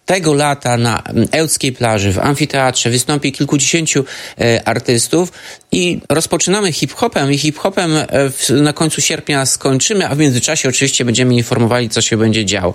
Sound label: hiphop